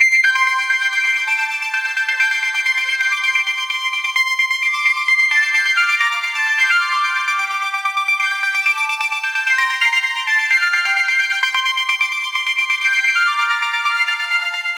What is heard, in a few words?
synthesizer melody loop